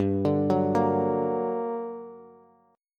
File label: G Minor Major 7th, down, Bass; GmM7 arpeggiated; JGuitar